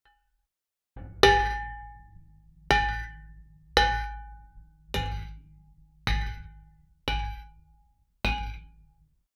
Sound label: footsteps on hard floor, mid frequency